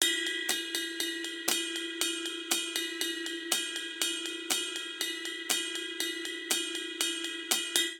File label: Bossa Nova Ride & Hihat 120 bpm; 4 4; MIDI Clips; Ableton; Factory Packs; Drum Booth